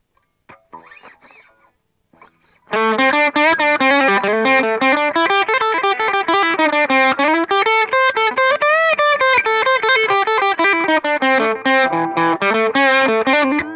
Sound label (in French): crunch G1 PP micro chevalet